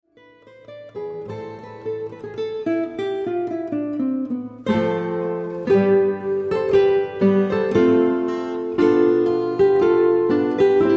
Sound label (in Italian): Larghetto; vivaldi; concerto 2